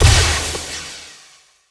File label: gravitons burst; Tanks